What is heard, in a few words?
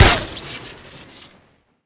syringegun shoot crit, weapons; tf2classic